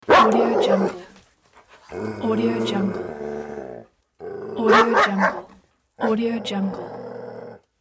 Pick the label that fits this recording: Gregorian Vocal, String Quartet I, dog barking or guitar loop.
dog barking